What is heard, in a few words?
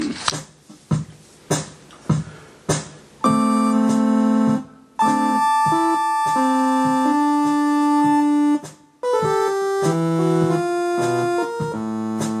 Saw Lead